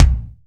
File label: kick drum sound a sharp key